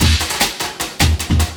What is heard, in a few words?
LOOP, 150 BPM, Drum & Bass